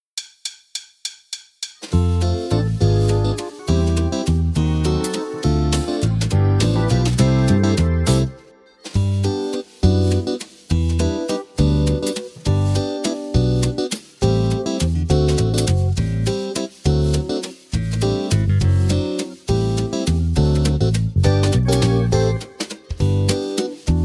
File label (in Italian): base, valzer musette swing